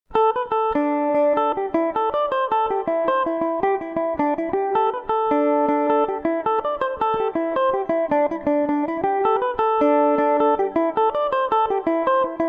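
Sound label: D mixolydian revelation, Irish mandolin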